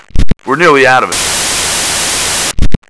die

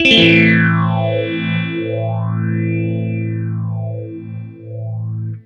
Clean Phase A min, guitar, clean phaser, chords; Guitar Special

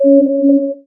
nav buoy

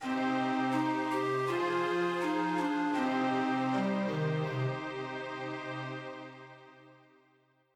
bomb lose

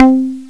marimba; Echo 1.1

